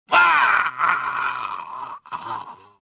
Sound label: choke; Star Wars Jedi Knight II Jedi Outcast; XBOX; lando